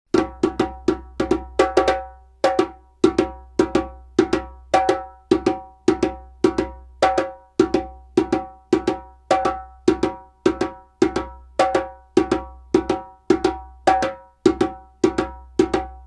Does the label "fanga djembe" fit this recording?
Yes